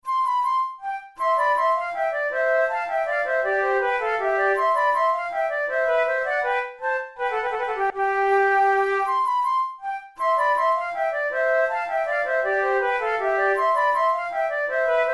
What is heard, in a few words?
menuets; Naudot; 6 babioles